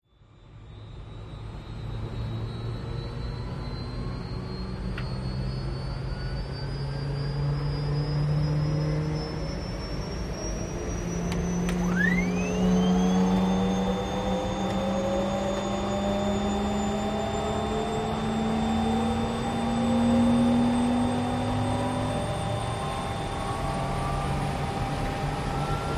UH-1 Huey: Cockpit Interior Power-up, Switch Clicks, Whine Up To Takeoff